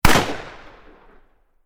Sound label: revolver gun